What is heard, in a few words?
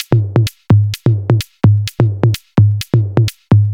Dirty Dutch Loop